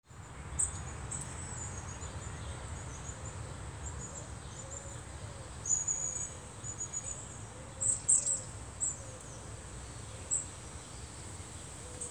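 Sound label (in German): Dawn Chorus, Vogelgesänge vor Sonnenaufgang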